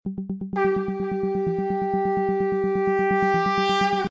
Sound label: Conch Shell